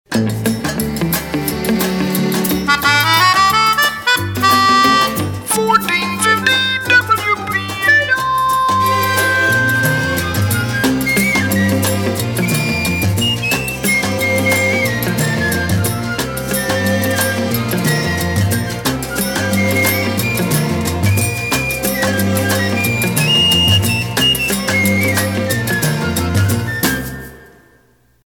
Bossa Nova Sono; WPAR; PAMS

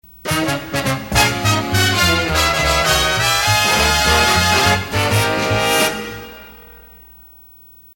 Trumpet Signature, instrumental; WPTR

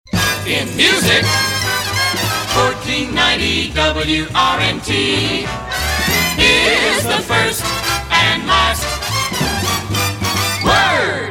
First and Last; WRMT; PAMS

